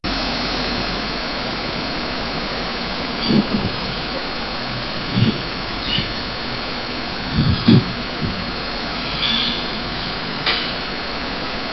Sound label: disturbing noise coming f, annoying